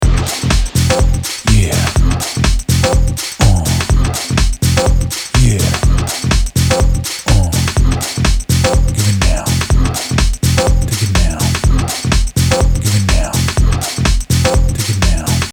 Track with processed vocal; 10 track starters

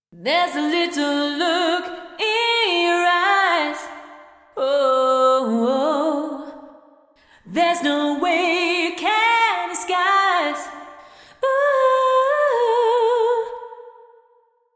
live wooden church; Computer Music; cubase

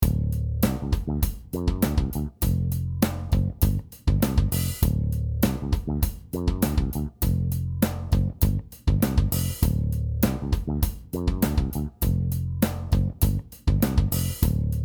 Following the bass, kick drums

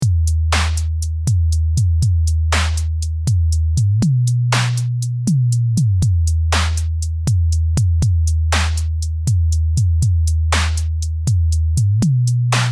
Sub bass kick

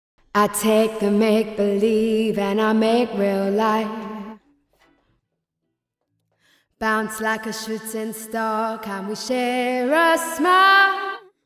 Gated Verb

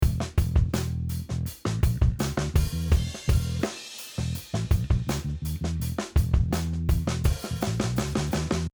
Tape Saturation, solid bass

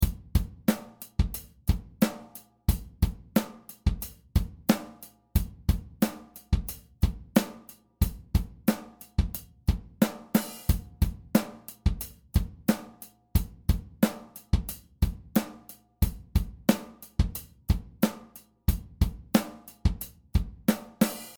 Dynamic hi hats

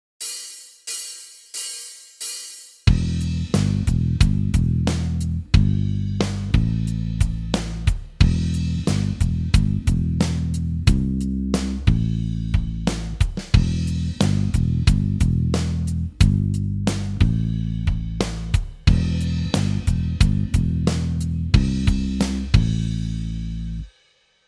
power chords, guitar; total guitar; play guitar now

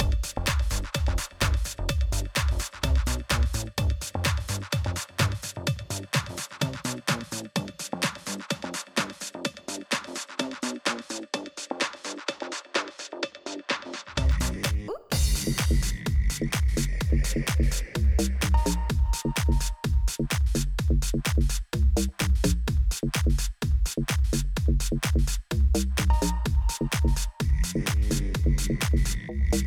House

